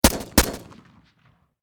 Machine Gun Sig Sg 552 Commando Double Shot Close Perspective; Warfare